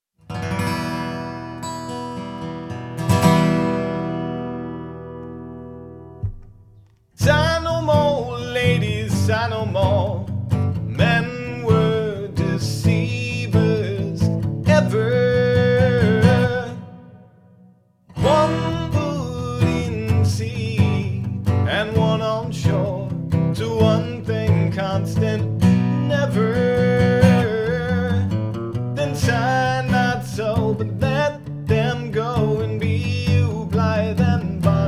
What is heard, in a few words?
acoustic